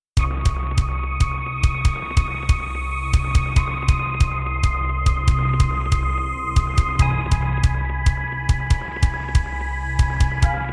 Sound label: Roland JV-1080; sigil doom episode 5